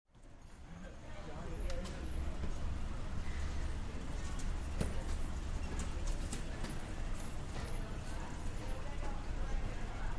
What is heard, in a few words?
Baggage Claim Area